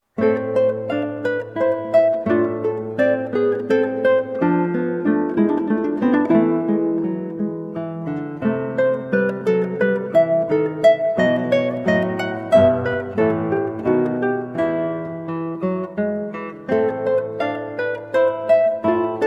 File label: Menuet, harpsichord suites on guitar; Bach; Menuet 1 from French Suite 1 BWV812